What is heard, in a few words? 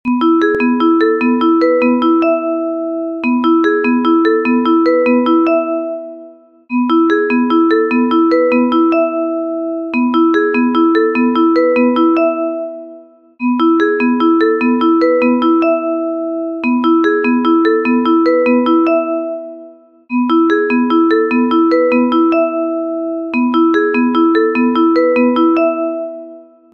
tandem ringtone looped; apprentice